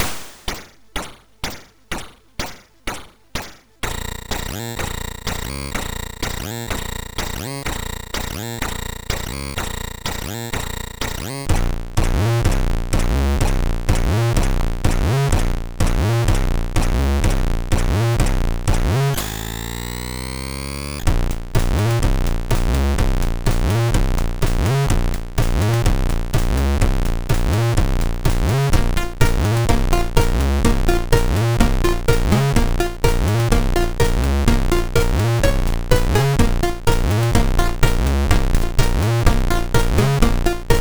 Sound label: sid, MOS6581; Luftrauserz